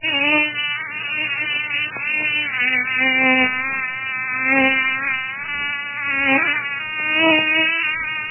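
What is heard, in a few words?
mosquito, single, long, squeaky, monotonous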